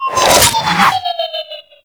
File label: Grenade Proj By; Prime Grineer Trapper; Warframe; Tau